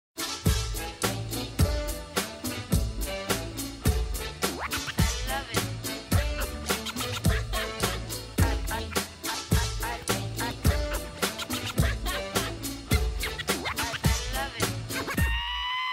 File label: cha cha